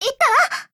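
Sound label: Death, Japanese; Littara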